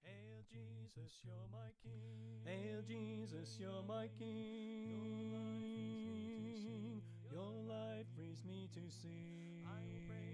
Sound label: Victory Chant Soprano Left